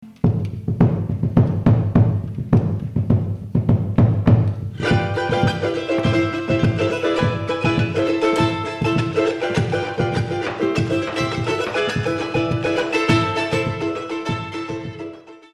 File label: bombo